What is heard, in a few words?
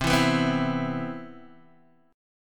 C Major 7th, Guitar DADGAD